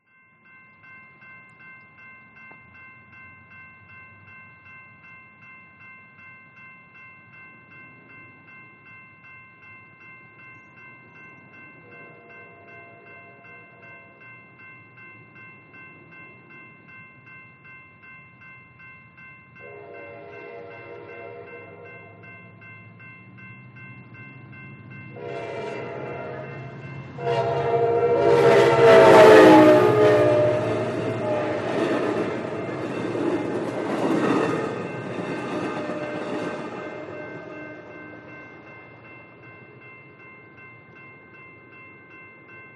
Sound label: Railroad Crossing Bell & Train Pass; AMTRAK, BSNF